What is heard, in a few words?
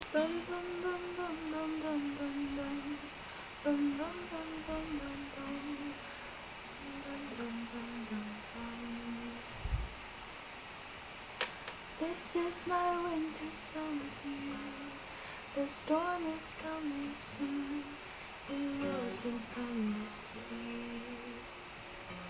Winter song